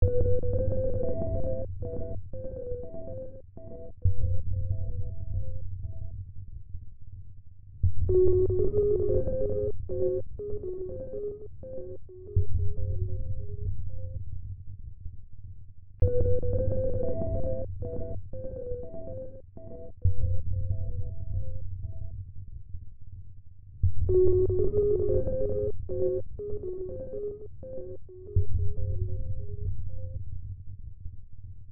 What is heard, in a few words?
piyo slowed